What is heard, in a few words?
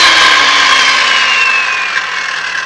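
SAW DOWN; casa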